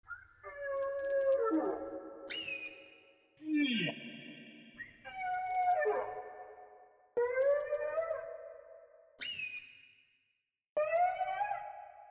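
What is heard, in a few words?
Whales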